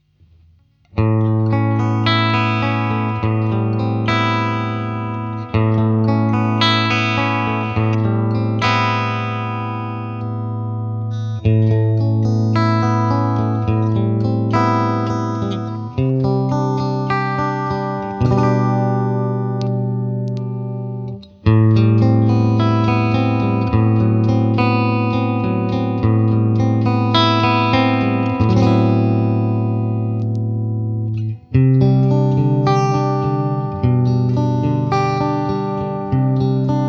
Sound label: Reverb Vibrato